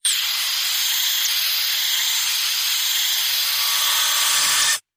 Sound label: Steam Pipe; Stale Air